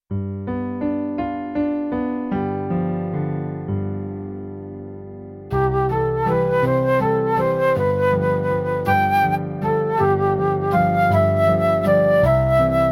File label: flute